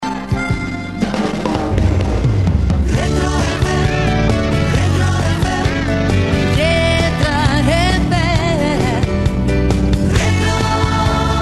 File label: retro fm jingle